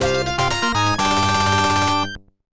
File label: Victory; Hippodrome; arcade; Victory II (Clear 2)